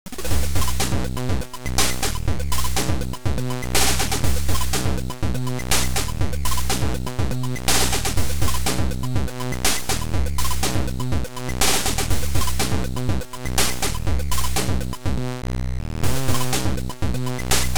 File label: BassNois